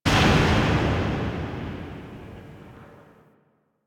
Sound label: Factory Thud; Warframe; Quests; TheNewWar; Ambience; Zariman